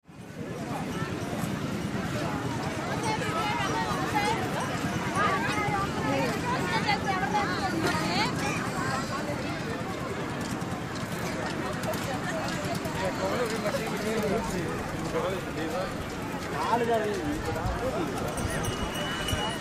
Beach resort ambience seagulls people and motorboats